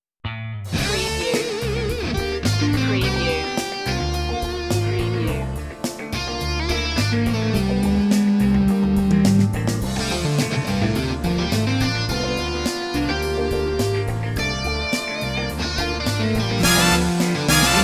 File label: guitar solo